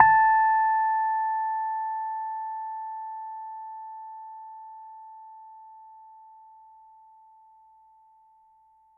A5 HI, Rhodes Mark II; CEPCo Sample Series Vol. 3

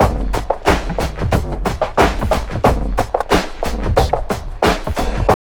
TRIP 90, trip loop

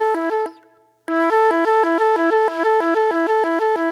Club Flute; Sonic Foundry (Sony Creative Software); Manhattan Flutes; Super Brown

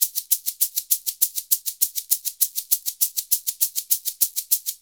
SHK, Shaker; Univers Sons; Basicussions

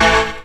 chord A; Techno Trance Essentials; CHORDS

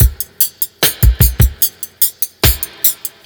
DRUMLOOP, Ragga & Dub Reggae

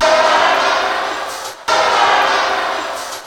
MACHINES

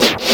Snare Scratch; Digital Sound Factory Xtreme Lead

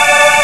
ah string major

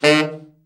BRA TENOR SN, SAX; Best Service Gigapack 1; BR SAX 4

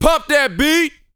VOX, Vocal, R&B Dance, HIP-HOP

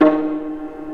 Vla Piz D#2; Digital Sound Factory Virtuoso

